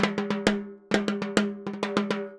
Timbali, Latin Percussion

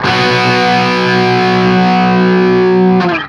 5TH B, Guitar; East West; Steve Stevens Guitar Samples Collection

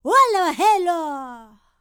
Vocal Planet World Voices, NOMADIC; ILIO; Vocal Planet VOL-5

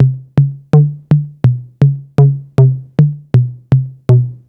808 tape mid tom

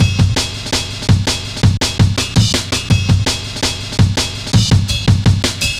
Samurai, Drum Loops, 165.5, Jungle; Zero-G Jungle Warfare 1, 2 & 3

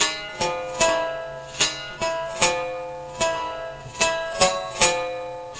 Ancient Strings; Sonic Foundry (Sony Creative Software); Loop Noir

